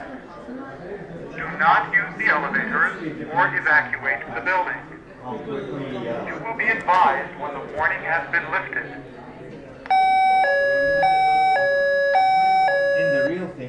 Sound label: weather drill